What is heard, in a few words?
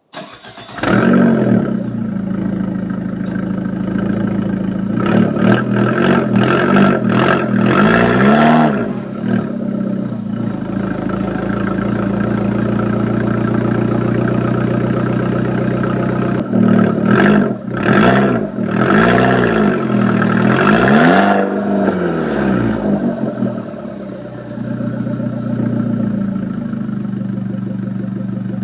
Abarth Sound